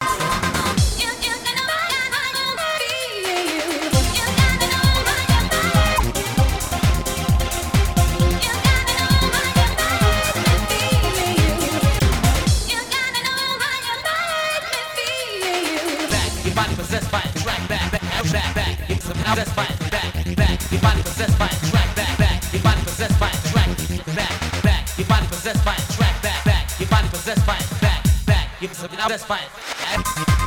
Gigamix

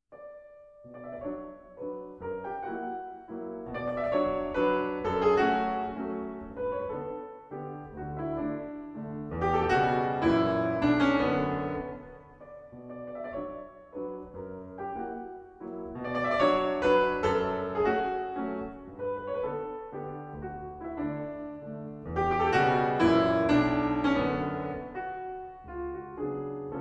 Mazurka in B Minor; Frederic Chopin; Op 30 No 2

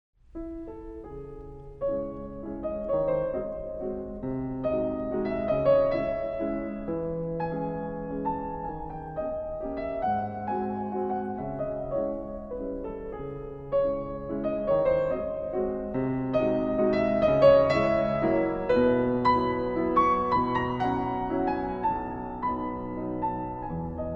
Mazurka in F Minor; Frederic Chopin; Op 63 No 2

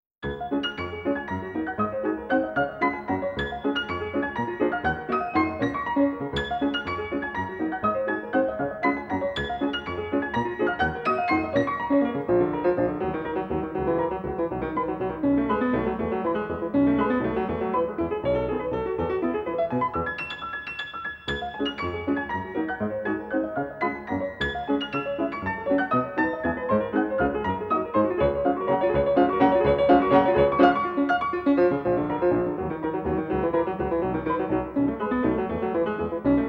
Waltzes for piano four hands Vivace in C Major; Johannes Brahms; Op 39; No 6

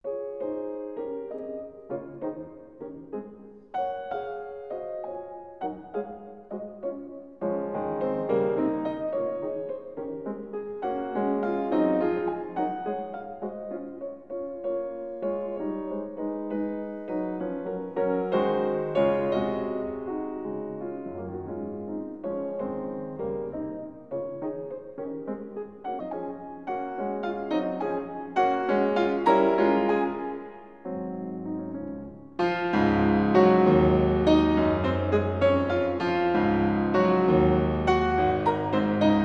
Piano Sonata in C sharp minor Allegretto; No 14; Moonlight; Op 27 No 2

